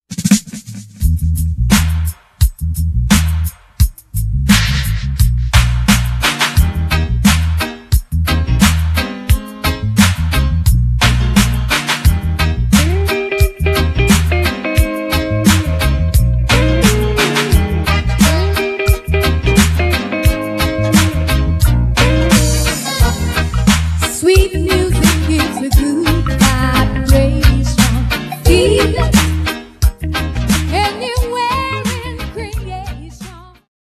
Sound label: reggae